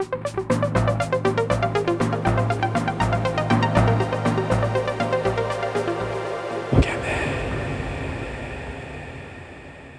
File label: jingle